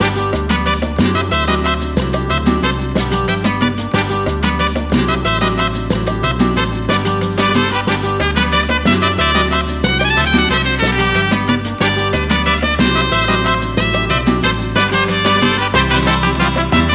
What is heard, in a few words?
Mariachi Ballad